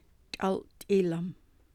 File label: singing